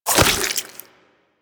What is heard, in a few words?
drop impact; Warframe; Hunting Critters; Nexifera